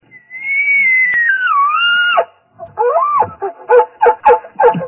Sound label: bugle, bowhunting